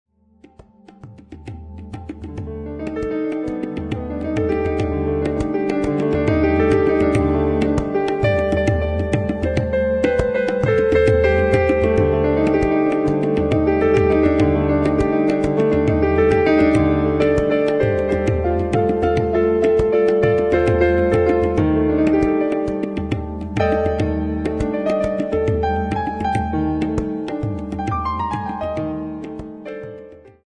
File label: piano raga